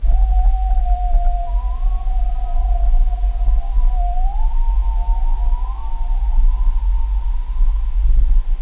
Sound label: moan; Quake; PainKeep